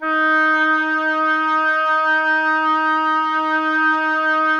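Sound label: oboe, wind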